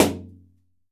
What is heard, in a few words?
shower, impulse responses; howard fredrickson